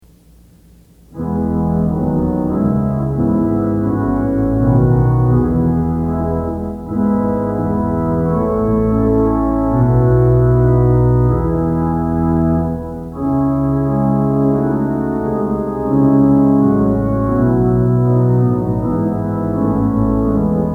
Humbly Lord, We Ask Thy Blessing Organ Music for Worship Services; Twenty-Eight Liturgy Hymns